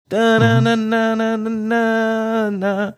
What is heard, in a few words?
singing mystery song